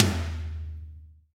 high tom drum e key, Royality free tom drum tuned to the E note. Loudest frequency: 2061Hz; tom drum samples